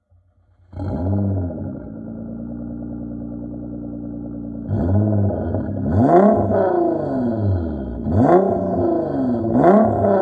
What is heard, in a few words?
Nissan GTR